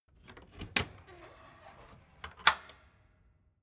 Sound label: door flap loft open